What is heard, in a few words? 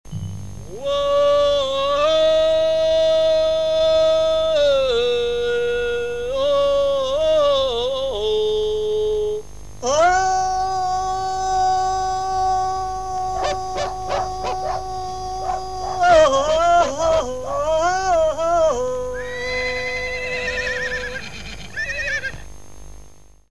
wohooo; ukr kino